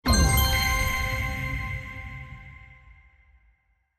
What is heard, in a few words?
small Win; dracula